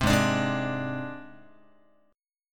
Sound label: G sharp Major Flat 5th, Guitar; G#Mb5 strummed; JGuitar